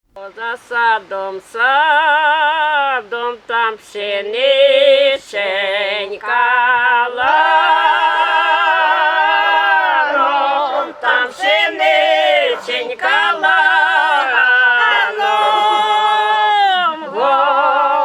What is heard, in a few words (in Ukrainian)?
spiv babus staryh pisen na lavczi pid tynom